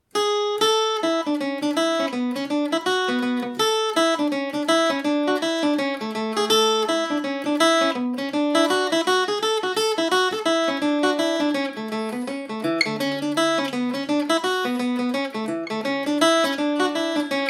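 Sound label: A major at 40, irish mandolin; linen cap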